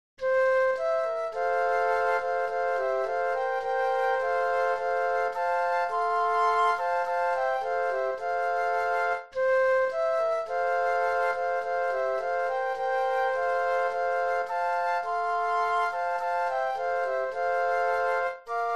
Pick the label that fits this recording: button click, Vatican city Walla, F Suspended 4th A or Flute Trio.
Flute Trio